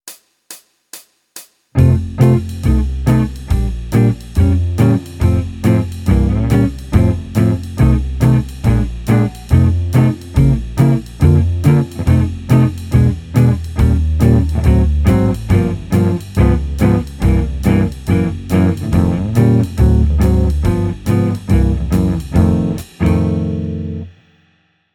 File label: Jazz; Guitar Techniques 2019 Issue 296 June